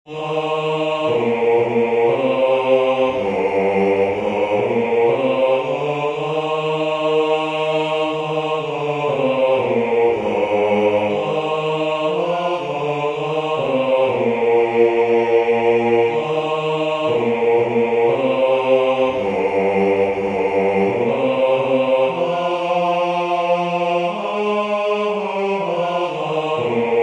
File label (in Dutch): BLIJF MIJ NABIJ Bass